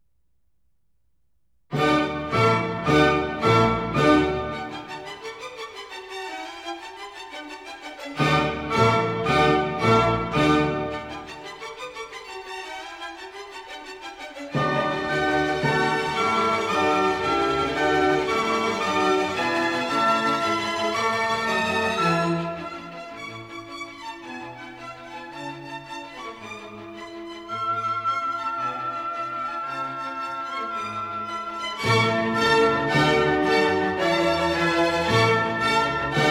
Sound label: Symphony in D major: Presto; Mozart